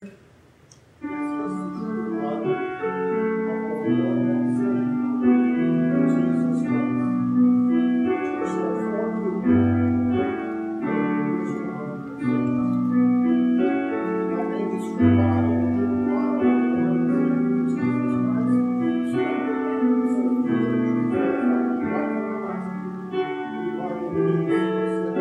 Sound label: soloist